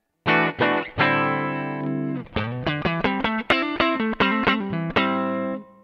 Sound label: strat direct cleantone